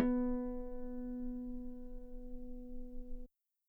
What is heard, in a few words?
guitar B3 very long piano harmonics; bananenkeyboard